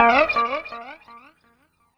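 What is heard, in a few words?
deep house FX, 125 bpm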